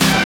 dance chord

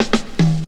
LIGHT 110, drum; BREAKS

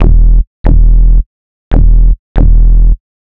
ELECTRO BASS 140 A

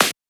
clap snare; Electro; drum hits; Anthemic

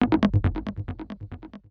FX SHOT TEKNO 140; Techno2; Singleshots

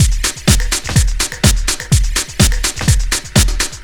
DEEPHOUSE BEATS 125

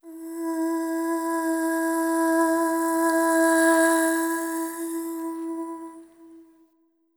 ETHNO FEMALE, Vocals; ethno2

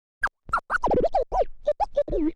OLDSCHOOL SCRATCH 96

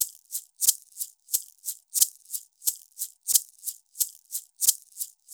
ETHNO EXTRA 90; ethno2

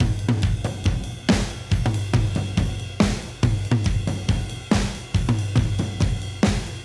Modern Breaks; Touch Loops; ClassicBreakSessions

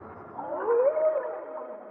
ethno, 125, A

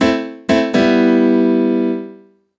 DEEPHOUSE PIANO 125 A; Keys

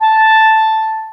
Alto Clarinet Portato A4; VarazdinOrchestral

